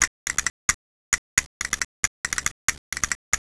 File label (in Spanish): CASTANU; Pipo-Aprende musica con Pipo